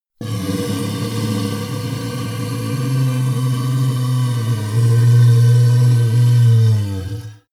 monster inhale low; kreaturen

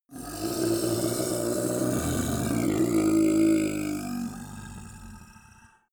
monster dark growl bubbling; kreaturen

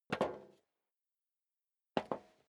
step leather shoes metal dry single stair down

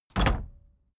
case lock close; objekte; tueren

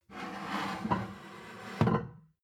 plate stack slide table, gastronomie; berufe; soundpool

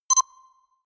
notification pager

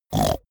snore short; soundpool

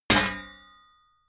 sheet ring; hits impacts

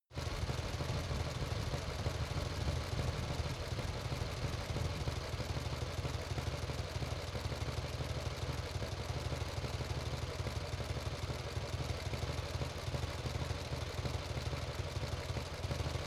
motorcycle harley engine idle rattle

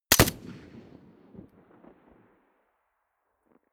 rifle ak-47 exterior fire single bolt; comedy cartoon; pistolen gewehre; Pistols and guns

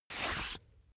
boxing gloves slip off; sport freizeit; boxen

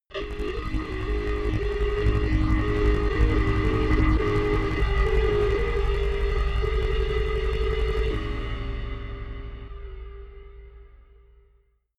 danger rumble, horror

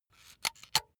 razor retract comb; soundpool

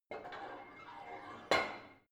barbell heavy plate put on soft, fitnessstudio; sport freizeit

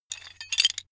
metal handling pieces

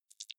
click noise, signale

